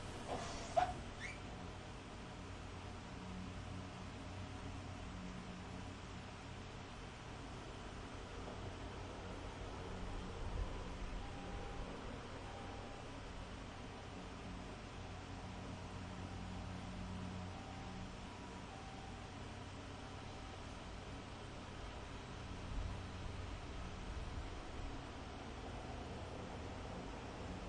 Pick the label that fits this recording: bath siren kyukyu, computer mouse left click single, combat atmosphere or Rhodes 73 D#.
bath siren kyukyu